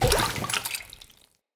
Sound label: Walk Water, Footsteps; Enemy; Characters; Lady Deora